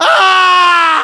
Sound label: death fall